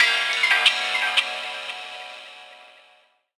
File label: RAW JAZZ FX; Rawcutz; Nu Forms